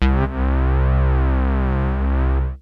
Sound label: TWIN DRIVE, mono poly; TECHNO-BWARE